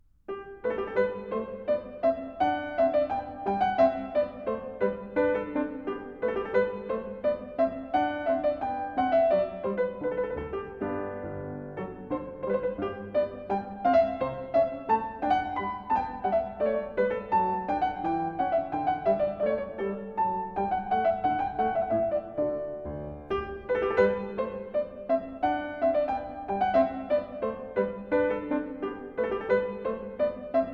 gavotte rondeau; haendel georg friedrich

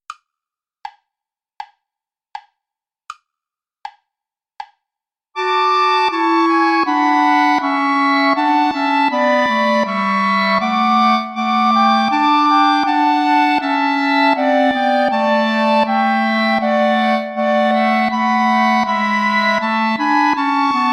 play along without bass clarinet; bach johann sebastian; ach mein herzliebes jesulein

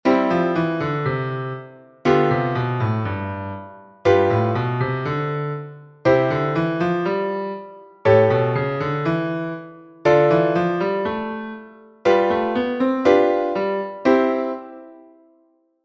etude study major; schytte ludvig

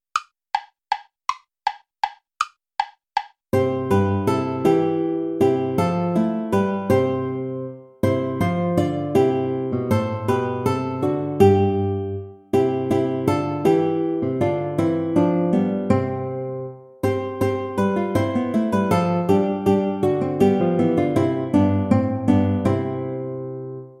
play along without guitar; vulpius melchior; gelobt sei gott

